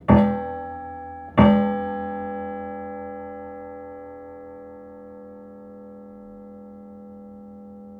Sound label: Multiphonics of the grand piano, flageolets